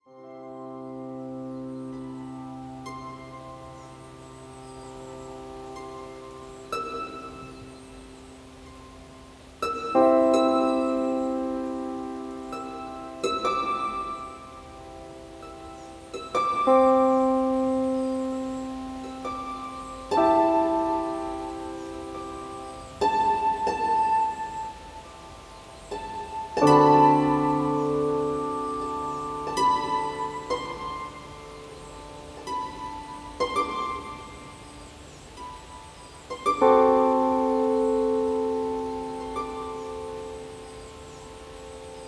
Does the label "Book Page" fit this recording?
No